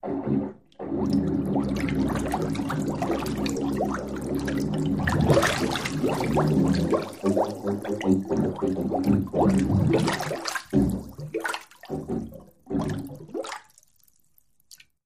Bathtub Fart Attac, Bathtub fart atta; farts

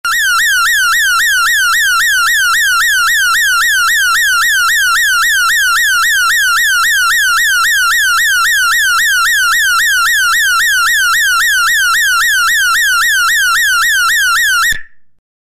Alarm Synthetic; hi-tech-various

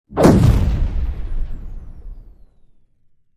Explo Shrt, explosion

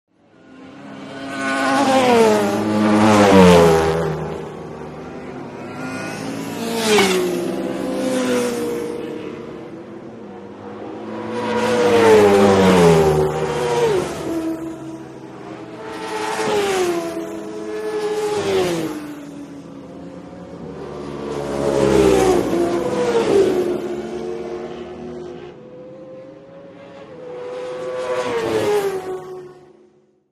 Motorcycle Road Racing

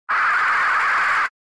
Distort Drone HF Stat, distortion

